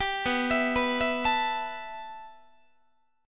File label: B 7th Suspended 4th Sharp 5th B down Guitar; B7sus4#5; arpeggiated